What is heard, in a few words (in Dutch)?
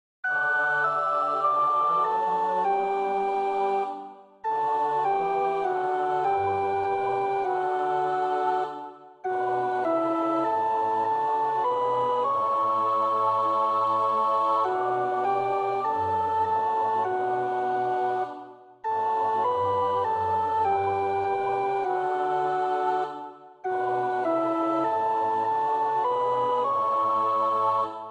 tenor; Nieuw Liedboek; NLB 750; Jeruzalem, jij stad door God gebouwd